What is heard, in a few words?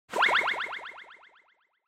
Motion Agility High Delay